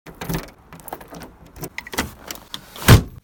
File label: jyguli, avtomobilia zhyguli lada